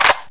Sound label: click on; Deers Revenge